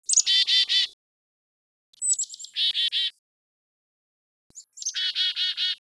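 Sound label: black-capped chickadee to great horned owl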